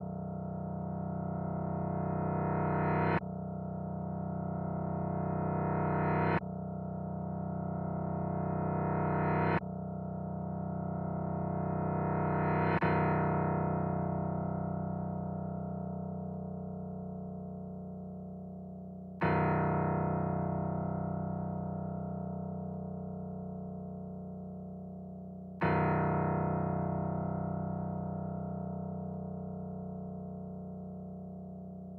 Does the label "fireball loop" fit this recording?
No